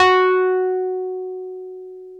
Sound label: PNO Wurly, F# 4; Keyboards of The 60's and 70's